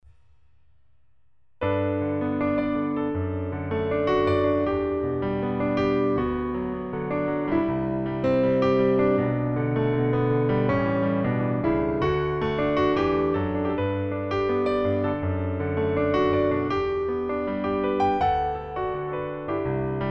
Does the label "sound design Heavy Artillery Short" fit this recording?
No